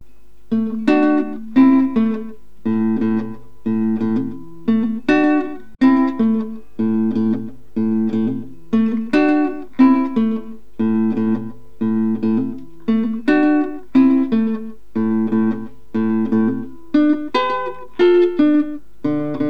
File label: Blues, guitar